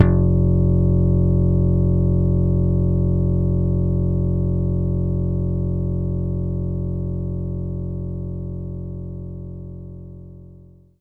Slap Bass F2

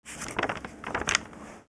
page turn

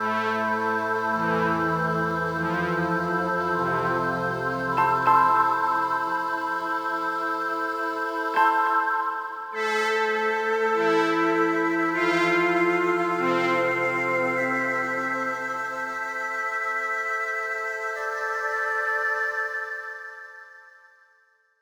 Acolyte Stinger

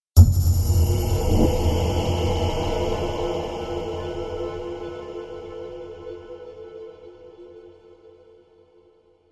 Game Start Audio; Vaccine

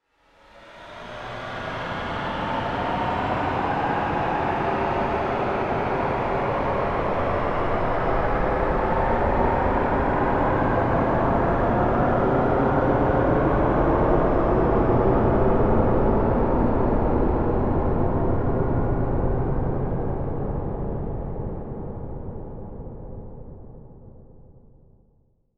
spaceship engine power up

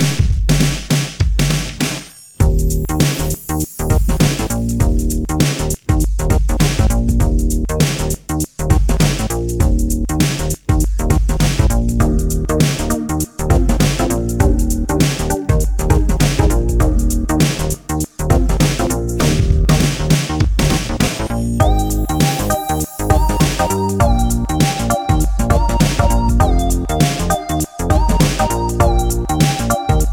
instrumental; FNF Funkadelix

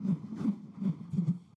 fan loop

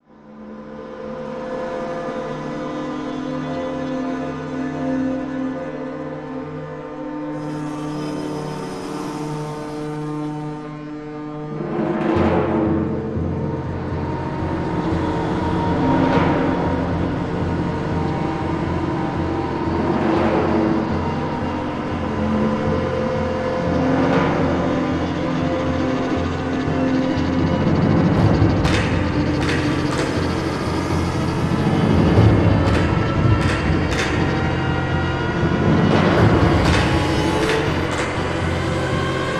STRINGS SCARY; transformers war for cybertron gamerip

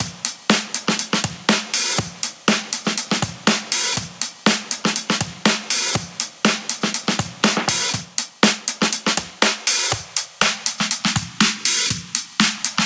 HipHop 121bpm Drums Base Intro Outro; hot lava; ios macos windows

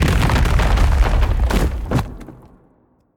Pteradon Rock Death

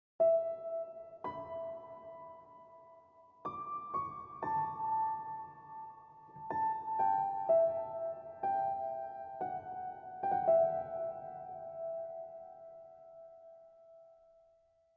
short piano for opening text

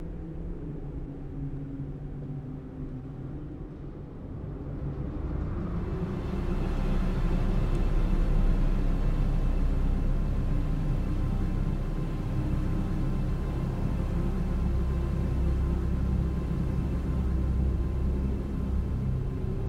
Ambience; Fallout Brotherhood of Steel; PlayStation 2 Xbox

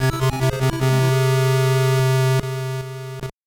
Jingle; Pocket Jansou; game gear

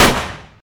Weapon Pistol Shoot

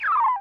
Game Saved

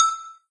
Combo Sound; candy crush saga gamerip

